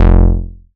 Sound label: Bass, 2600 F1, Synths, ARP 2600